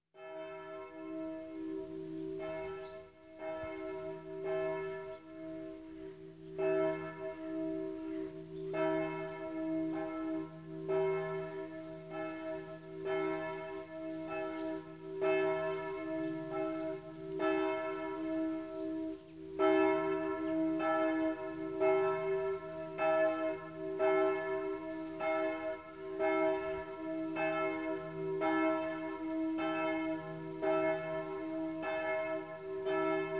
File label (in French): cloches; Chaussy